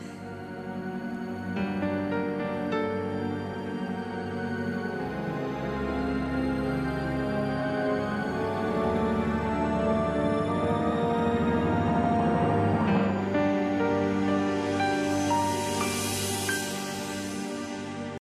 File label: Horror Cliffhanger